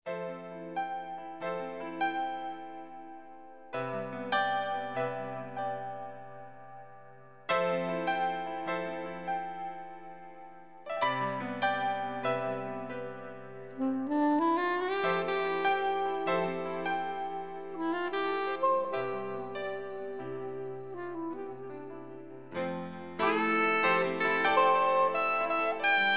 quartet

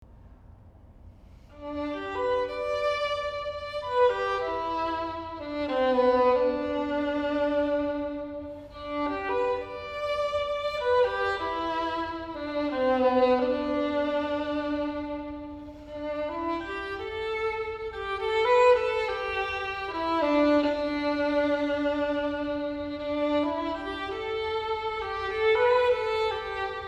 Waltz, violin

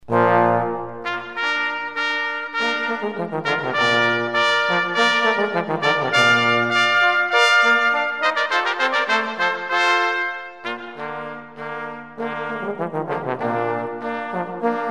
Fanfare from Water Music brass quartet; Classical Quartet

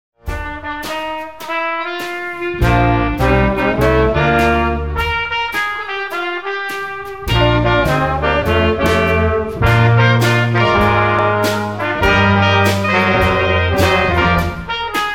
Dixieland Band

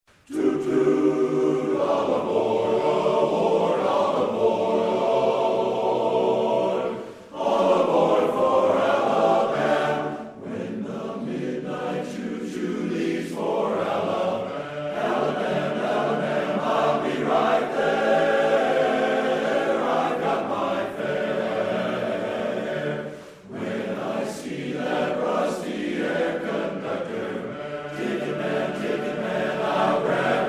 acappella group

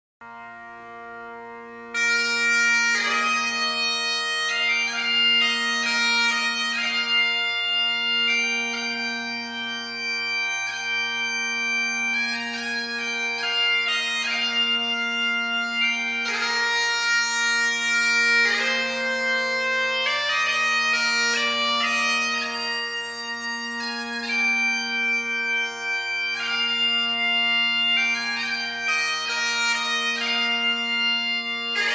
with organ, Celtic bagpipes

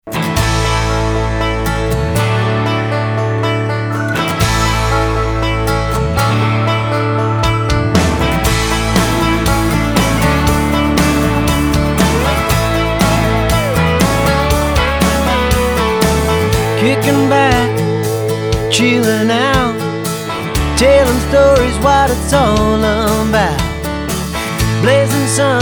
Doin Country; Cover-Band

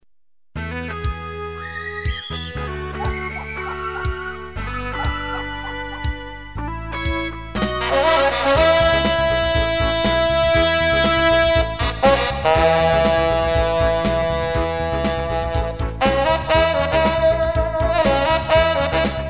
Saxophone

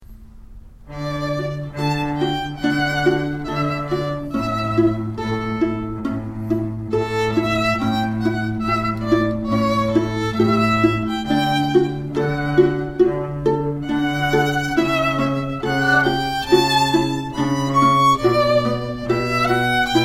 string quartet; Vivaldi; Louisville Strings

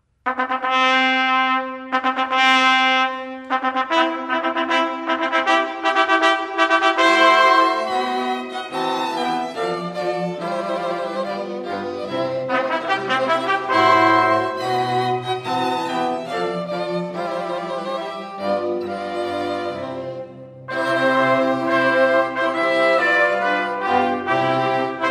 Wedding March String Quartet 2 Trumpets; Mendelssohn; Classical

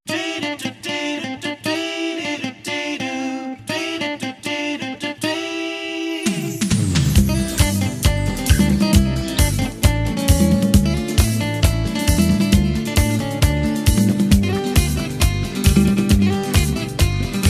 guitar; Live Band; Latin Beat

